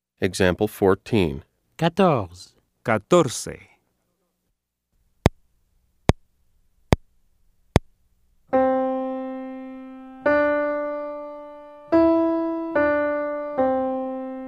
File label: Keyboard